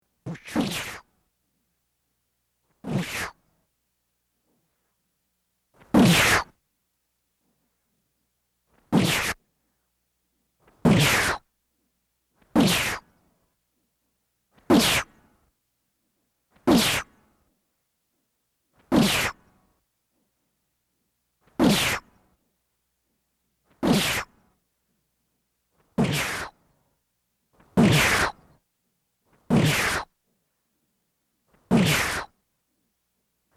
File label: japan slashed by sword